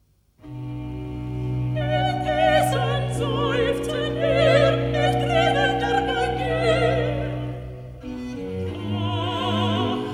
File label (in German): Rezitativ Sopran, Alt; Bach Oster-Oratorium, BWV 249; Ludwigsburg 1968; VIII. Rezitativ Indessen seufzen wir